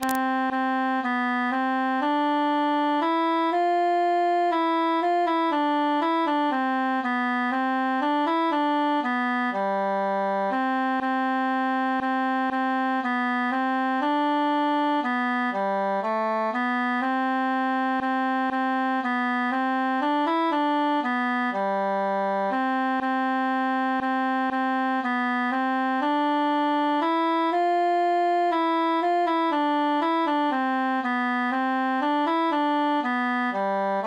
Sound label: altus solo; li jalous par tout sunt fustat tuit cil qui sunt enamourat veritatem